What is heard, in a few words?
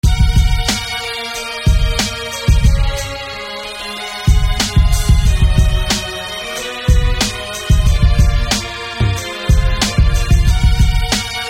Violin; Diamond; SFB FX